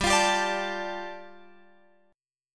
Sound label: G 7th Suspended 2nd G, Guitar Open F; G7sus2 strummed